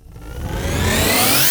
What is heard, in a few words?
Sweeps Short